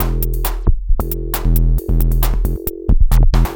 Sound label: Drums Strange